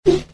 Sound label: Ouuh